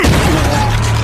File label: anime brutal punch